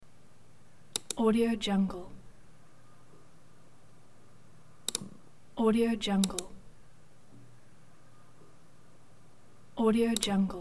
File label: mouse clicks